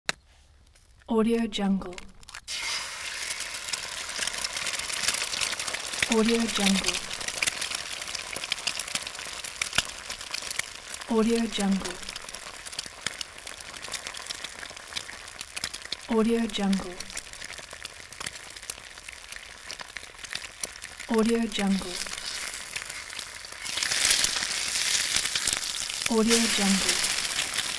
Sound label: cowboy cookout